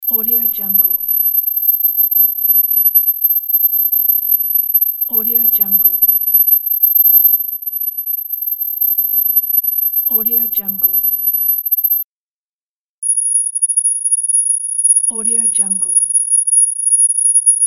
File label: ear ringing